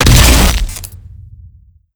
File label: gauss siege fire; doom gauss